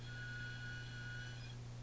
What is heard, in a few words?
plastic Flute